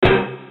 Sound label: extra ball, keno; games; Bingo